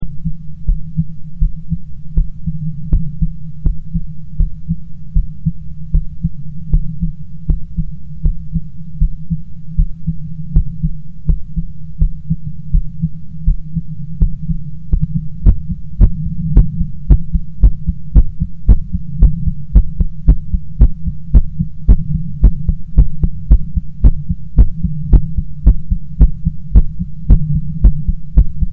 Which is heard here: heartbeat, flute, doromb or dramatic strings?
heartbeat